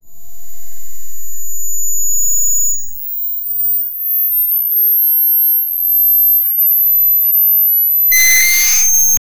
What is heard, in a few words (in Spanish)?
un sonido de feedback de, distortion